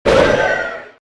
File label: Punch It Back, DJ sounds